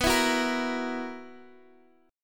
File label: B Major 7th Suspended 4th, Guitar Half Step Down chord